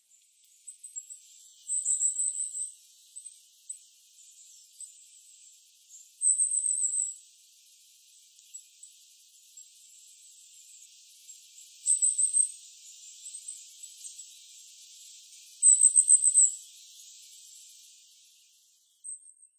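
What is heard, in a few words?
Goldcrest; kukuitadaki; nasushio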